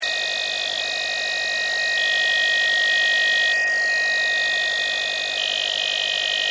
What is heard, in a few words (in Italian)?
dcf disturbo